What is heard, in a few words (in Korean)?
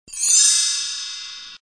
별 터짐; deepsky